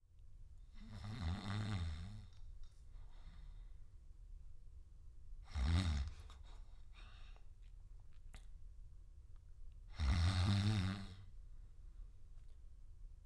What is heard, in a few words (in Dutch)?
Snurk